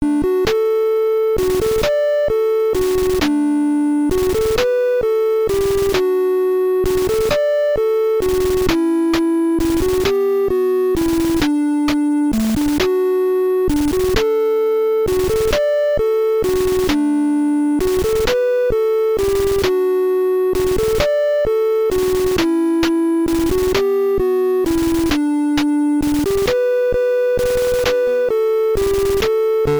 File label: Defeat; North & South; NES